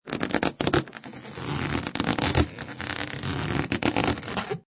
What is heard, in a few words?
Balloon Rubbing; Balloons; Lynne Music